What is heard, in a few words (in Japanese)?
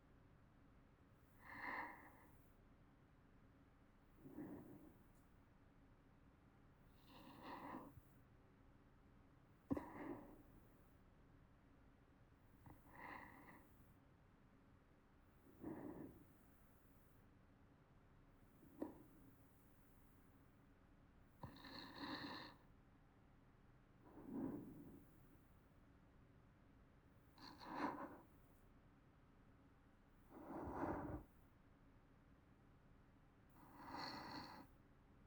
寝息ループ; 夏葉の寝息ループ